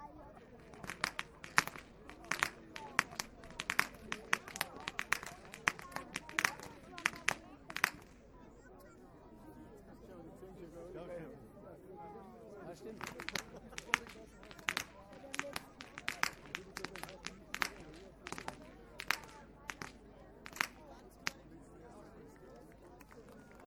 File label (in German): schnalzen, jugend